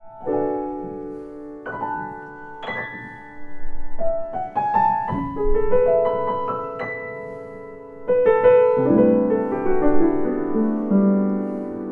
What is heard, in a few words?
Petrof 118, piano